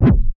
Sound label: Whoosh, cartoon caper samples, Classic SFX; musicradar